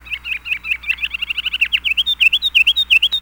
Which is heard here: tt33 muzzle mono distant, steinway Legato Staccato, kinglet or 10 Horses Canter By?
kinglet